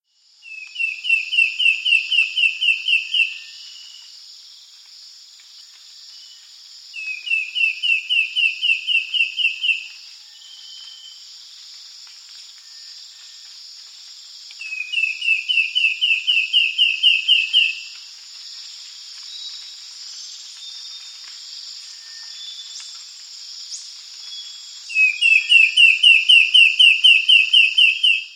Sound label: Sooty Antbird, S. America